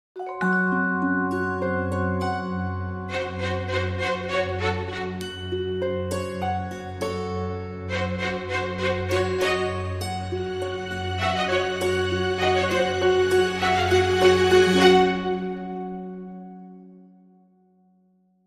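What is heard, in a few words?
Dramedy Sting, No Percussion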